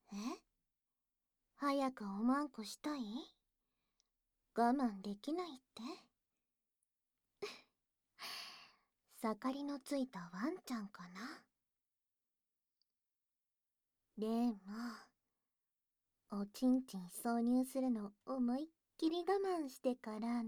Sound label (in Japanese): 耳舐め手コキで射精寸止め; 効果音なしver